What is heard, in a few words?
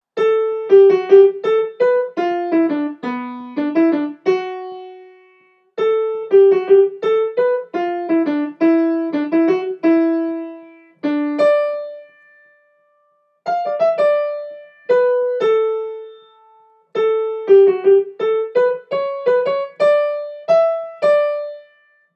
cheesy melody